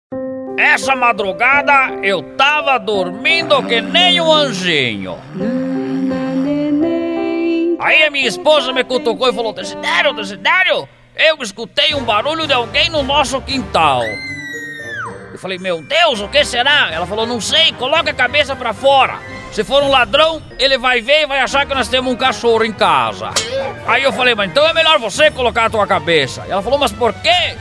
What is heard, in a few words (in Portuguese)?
barulho de alguem no quintal